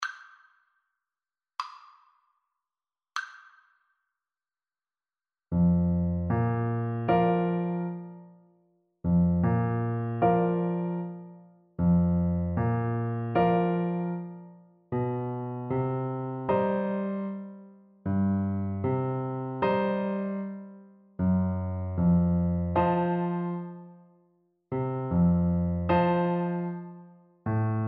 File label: por, Tuba